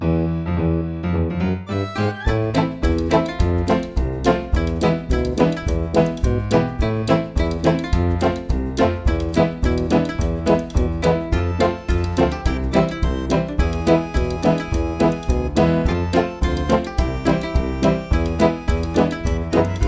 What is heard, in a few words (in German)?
Die drei Räuber instrumental